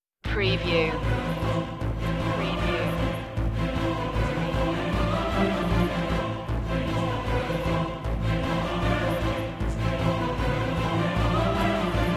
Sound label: storm riders no perc